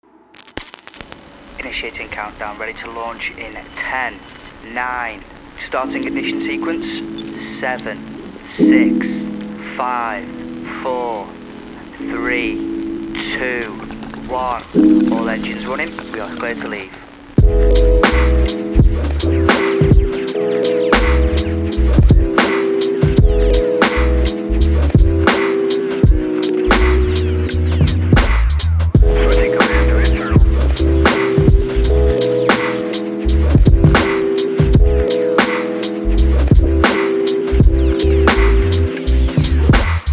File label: Noise Spaceship